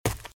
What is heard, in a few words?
Footsteps - Leaves - Sprint - Right; RadwinIsland